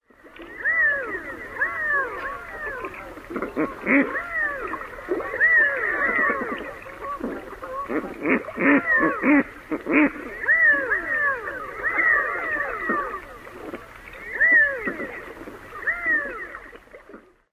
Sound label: courting group